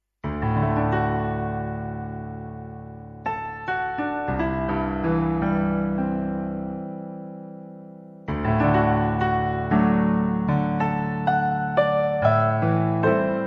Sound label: relaxed